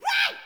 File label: Sprout Vocal Surprised